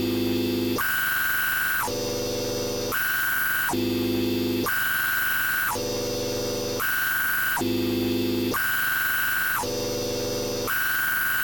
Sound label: error